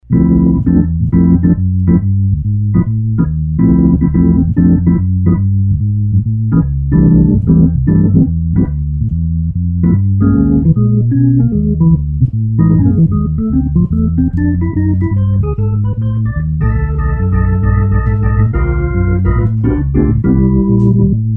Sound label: Hammond C-3 examples various jazzy